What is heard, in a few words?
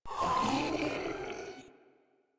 Proto Infested Ancient Vocal Pain, Enemies; Warframe